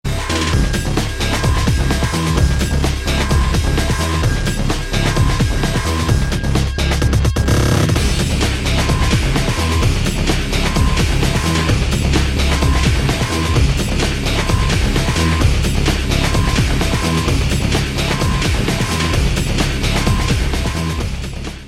guitar quake

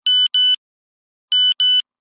nokia standard notification

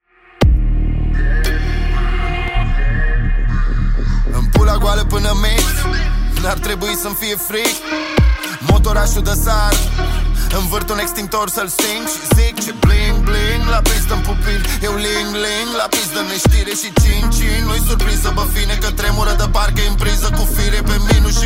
hip hop

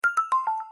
alert

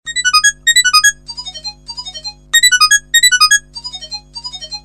nokia x7 sms

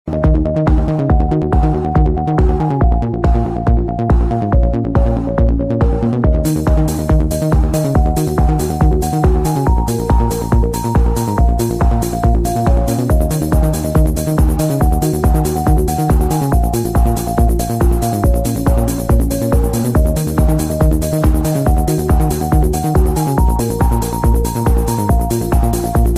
classical, ringtone

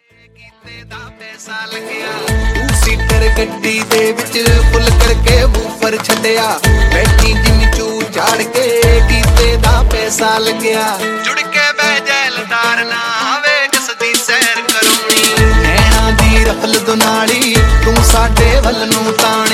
punjabi

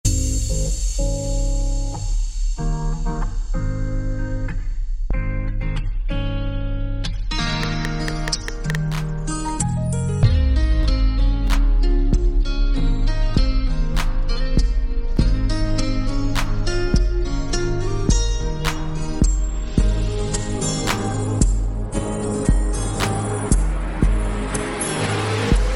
trap, ringtone

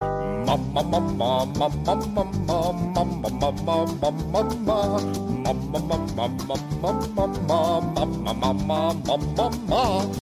norwegian ring, ringtone

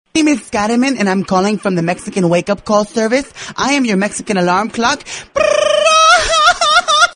mexican wake up call

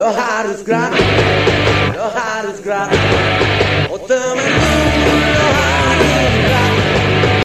romantic Indonesia ringtone

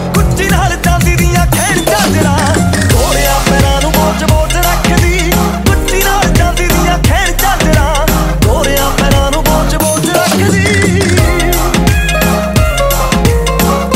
punjabi

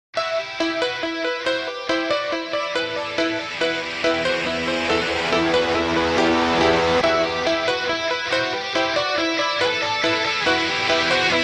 iphone 7 piano tone

